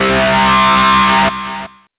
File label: Evacuate